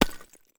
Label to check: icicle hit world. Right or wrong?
Right